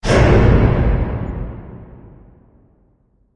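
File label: Hit Swoosh Slicer; Brand X Music; Brand X Music Catalogue - Editor's Essentials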